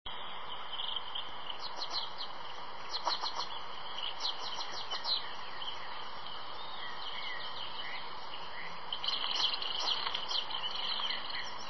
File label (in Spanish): Tuquito Gris, Empidonomus aurantioatrocristatus, Crowned Slaty Flycatcher; cantos